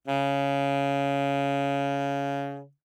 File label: Alto Sax No Vib ff Db3, Eb Alto Saxophone; Woodwinds; MIS Pitches - 2014